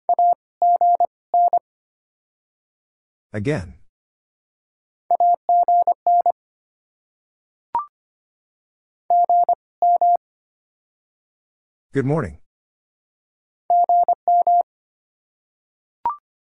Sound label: Top 20 QSO Elements - 2x Character Spacing - 25wpm, morse code practice